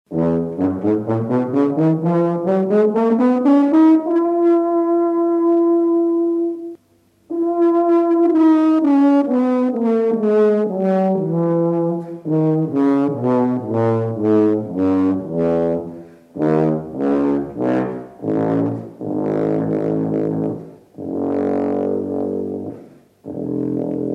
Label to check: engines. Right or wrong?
Wrong